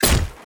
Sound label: ultimate attack impact; minsc